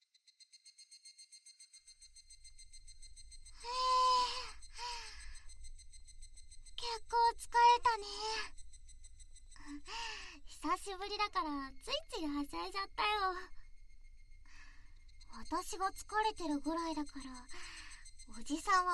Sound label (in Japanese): 耳かき, ASMR; 夕方